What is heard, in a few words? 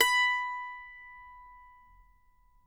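B5, Mandolin; Sampleheads; Whole Lotta Country 1 & 2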